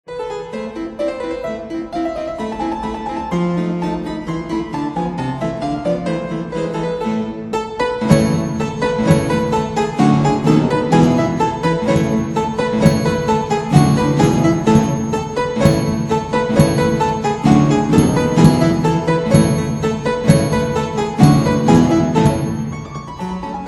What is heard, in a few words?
johann fritz fortepiano